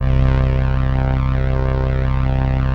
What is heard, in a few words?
Atmo Pads; Best Service; XX Large